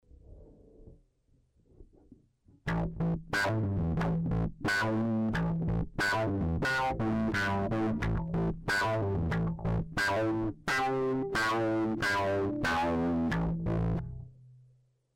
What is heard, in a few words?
wah; Snarling